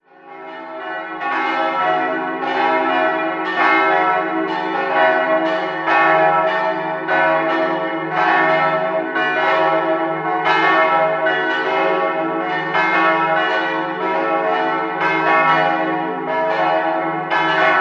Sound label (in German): Regensburg Niedermuenster, Glocken